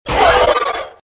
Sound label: Velociraptor - Cry; RadwinIsland